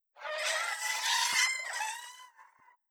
Hydra Vo

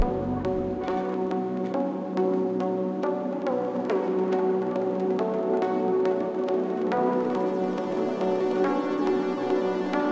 Boss Loop

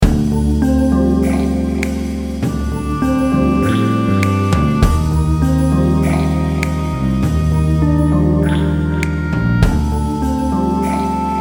Cave

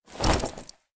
Armor Drop

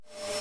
Void Suck; viewfinder

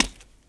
Foot Step

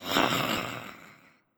Skel Soldier Strike Vo

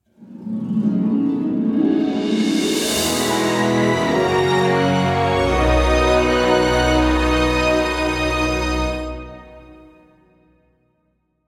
Fanfare Victory; BattleHashima

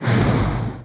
Sword Shoot; gamerip; Radiance

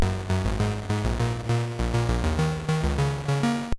Game Start; Jinks; Atari 7800

